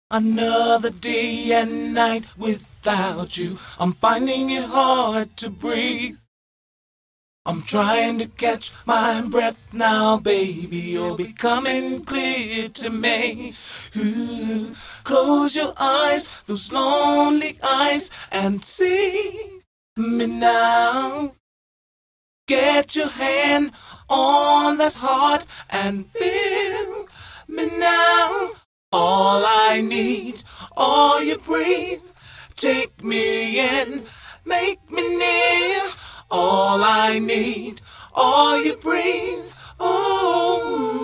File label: acappella